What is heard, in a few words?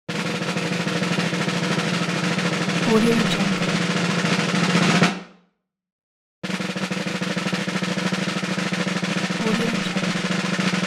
snare roll long